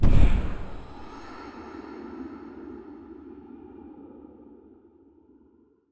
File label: Coffin Ghost